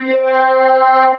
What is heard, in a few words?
talk box choir